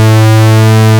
J60 PWM A2, Leads; Mostly Leads

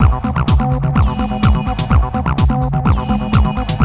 ACID JAM

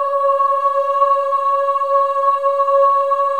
OH C#5, CHOIR OHS; Spectrasonics; Symphony of Voices

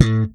SLP POP A, PEDULLA BASS; Masterbits CLIMAX 7 - Bass

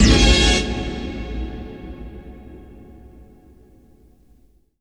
CYBER HORN; Best Service Dream Experience; FILM-TOOLS

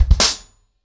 100BPM, ACOUSTIC DRUMS; Sonokinetic - The Roots Drumloops

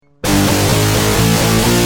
Guitar, Industrial

